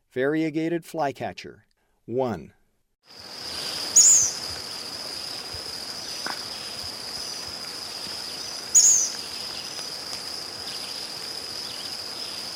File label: Variegated Flycatcher; S. America; Eastern Lowlands